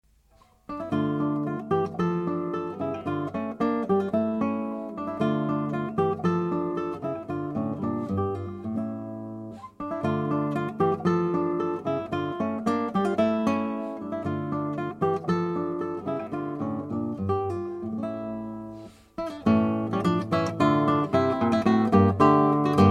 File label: visee bourree, guitar